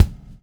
BUBL; Spectrasonics - Backbeat

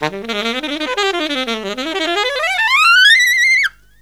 SAX, Killer Brass Riffs

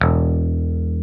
PICKED E1, Classic Guitars; E-MU Formula 4000 Series Vol. 3; Analog Odyssey

